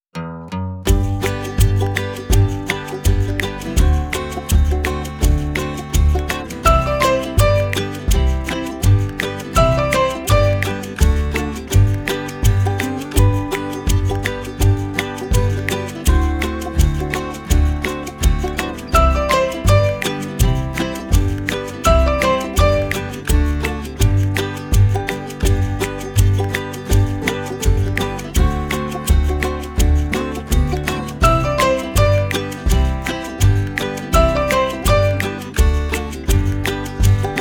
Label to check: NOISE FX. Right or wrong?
Wrong